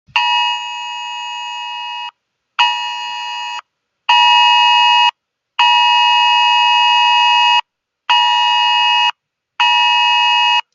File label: amber alert sound